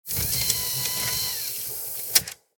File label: Casino slot machine receipt printer